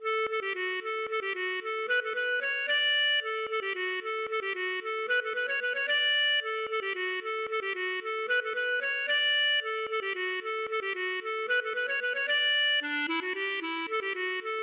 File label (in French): bourree